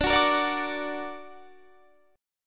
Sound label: D Augmented D down Guitar Standard; D+ strummed; D+ chord diagram; JGuitar